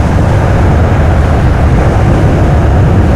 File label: cryo big pipe; Quake 4 Alpha; id software